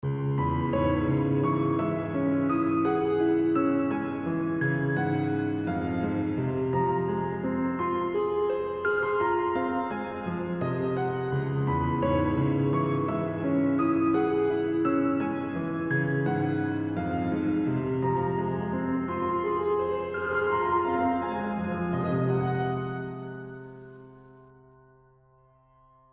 piano

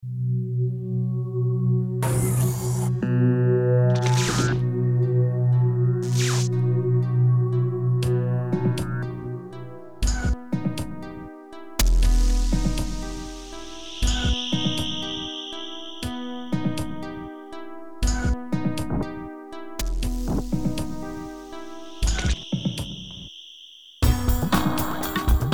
industrial mind

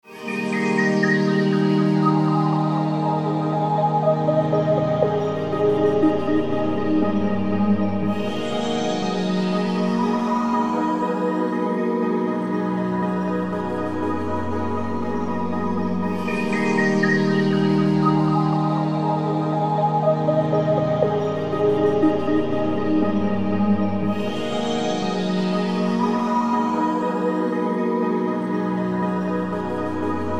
pad

